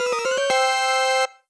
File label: Retro Success; blue archive